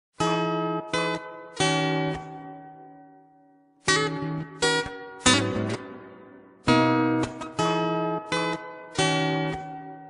INSTRUMENTAL RINGTONE

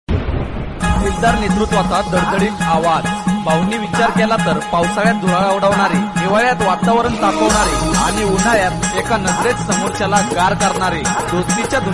MARATHI RINGTONE

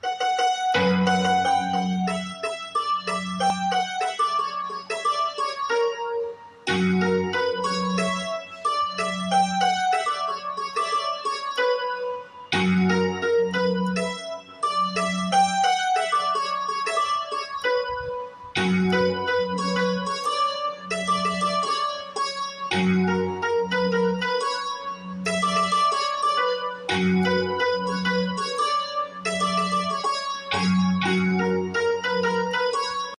INSTRUMENTAL RINGTONE

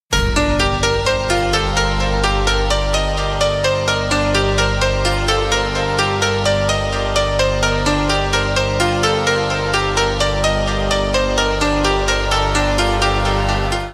instrumental ringtone

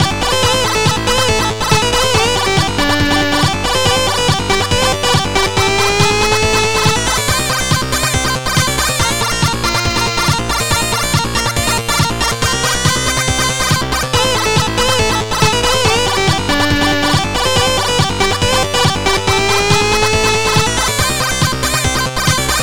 KEYGEN MUSIC RINGTONE

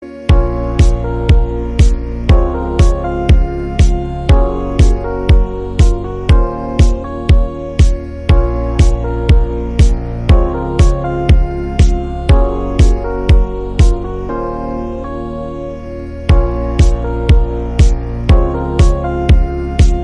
instrumental ringtone

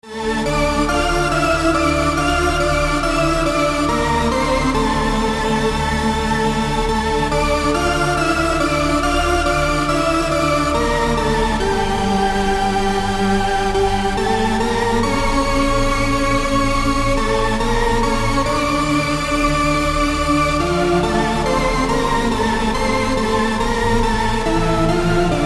instrumental ringtone

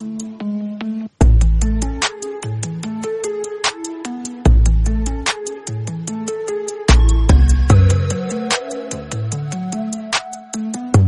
INSTRUMENTAL RINGTONE

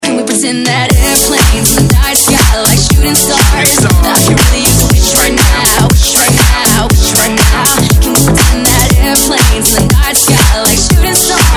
REMIX RINGTONE

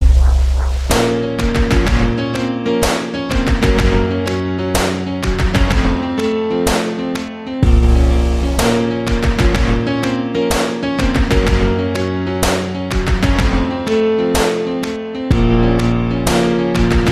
PIANO RINGTONE

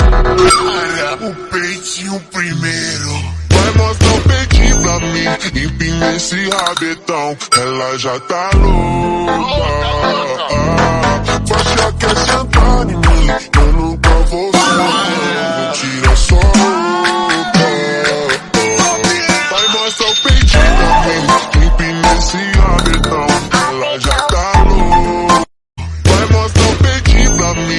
brega funk remix ringtone

